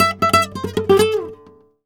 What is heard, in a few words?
a, Flamenco Guitar Picado; Picado 1